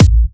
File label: Bassdrum; Vengeance Samples